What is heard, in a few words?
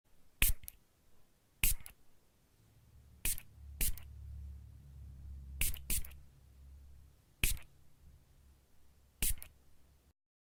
Perfume Spray